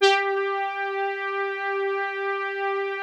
P-10 TALK, PROPHET 10; Greytsounds VOL-1

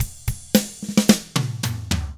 POP ROCK, Double Platinum Drums; 109POP ROCK

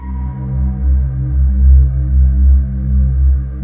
C2, Choir Acoustic & Synth; USB Soundscan vol.28 - Choir Acoustic & Synth; AKAI

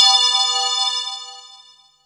RING BELL FX; Back In Time Records - Big Bang

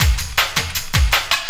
LOOP, 160 BPM, Drum & Bass

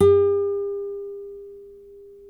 NYLON NO VIB A 4B, Guitars; Spectrasonics; Hans Zimmer Guitars VOL-1

